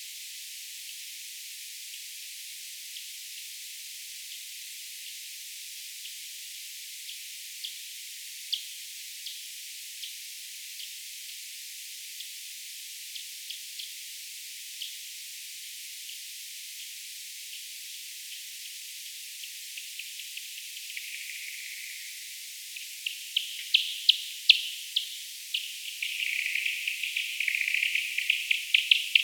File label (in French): FM nasillarde E serotinus ss bois; Correze